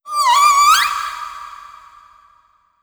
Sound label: Critter Female Verb; moul; Kahlo